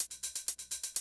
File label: Classic DrumLoops, 125